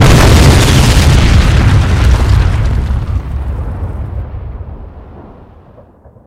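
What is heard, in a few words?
destructive long explosion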